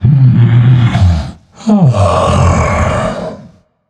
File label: nv breath, vox; smite; voicepack; vulcan